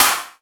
SD, Yamaha RY-10, Drums

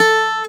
CG SOFT A3, C. GTR SOFT, Celtic Flavours; USB Soundscan vol.42; AKAI

